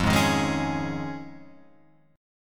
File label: F Minor 7th Sharp 5th, Guitar, Open F; Fm7#5 strummed; 5,3,3,3,4,3